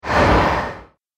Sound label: Sturdy Sweep No Delay, Whoosh Sound Effects